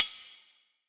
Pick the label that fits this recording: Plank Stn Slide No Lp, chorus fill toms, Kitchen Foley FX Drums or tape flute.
Kitchen Foley FX Drums